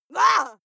female lunatic scream short

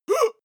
male attack groan short; schreie; menschen

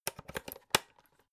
electric whisk insert whisk; haus-garten; kueche

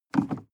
sports car int pedal gas step and release hard; autos; verkehr transport

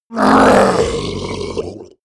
orc die

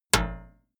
plate hit damped crunchy

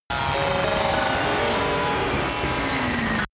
facility error horror, sciene-fiction

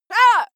female fight moan pain short; schreie; menschen

